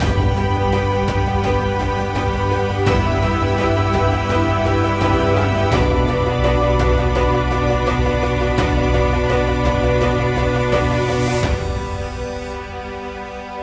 Ascending Emotive Strings Action Track

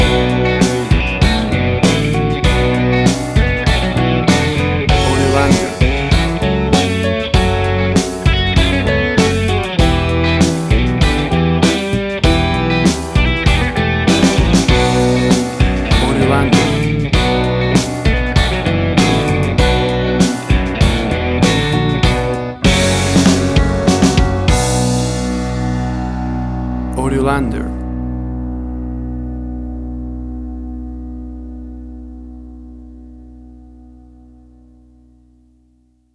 Blues School

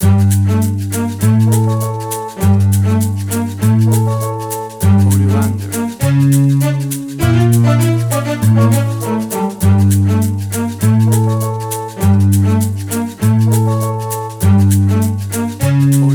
Arabian Soundscape Music Bed Full; AUDIOLANDER

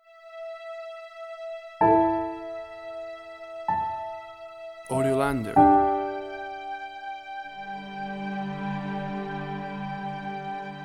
Notes In Privacy Minor

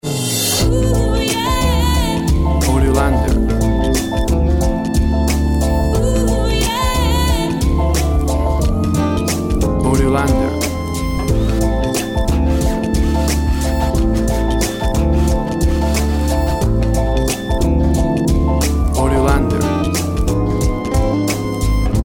hip jazzey

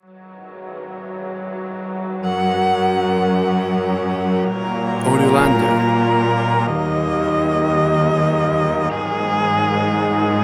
Ancient Wisdom Modern Love

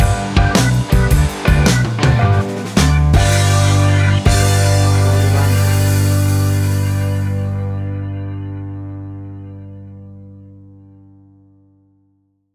Bluesy Country Rocking Music Stinger

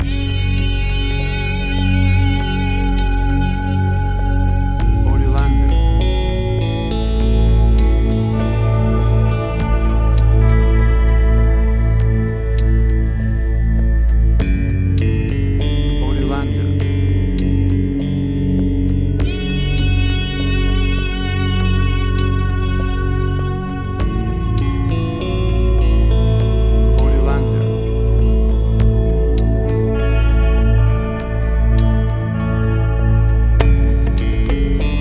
Ambient Electric Guitar; 60 SEC MIX

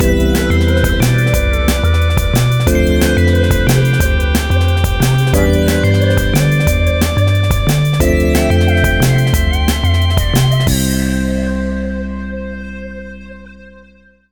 Acoustic Style Drum and Bass Music; 15 SEC

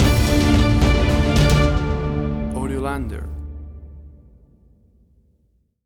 Adventure Action Movie Soundtrack Music Stinger

